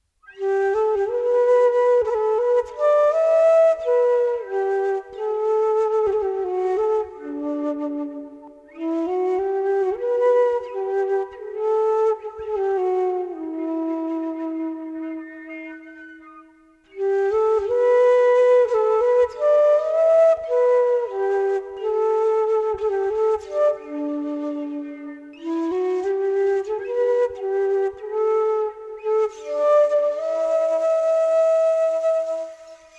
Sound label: ilish flute